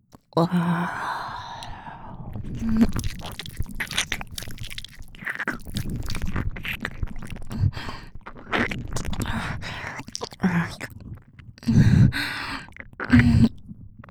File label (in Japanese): 触手+耳舐め音ループ（触手強ver）